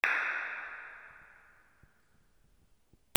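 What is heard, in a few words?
percussion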